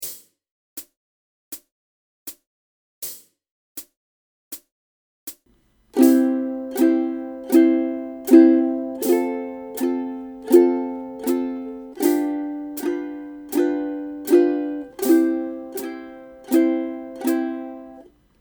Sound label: 44 Strum